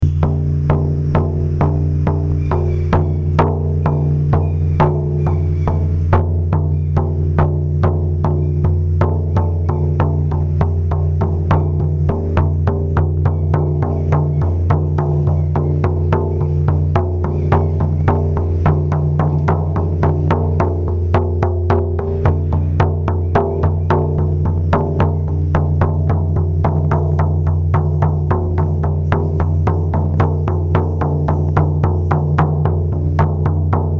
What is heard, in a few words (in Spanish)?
tambor ovoid violeta; ovoide